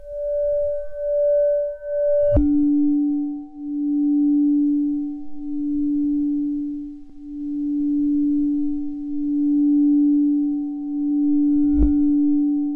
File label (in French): verre